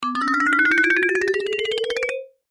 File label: Cartoon Mallets Ascend; Cartoon Music; Cartoons; Blastwave